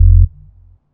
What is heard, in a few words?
Elc Bass; Equipped Music